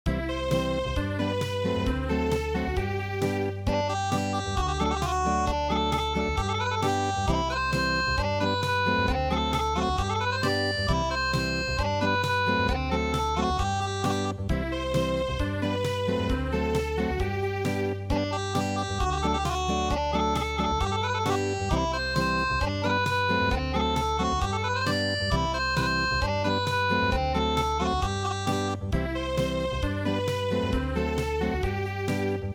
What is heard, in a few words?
swing Unplugged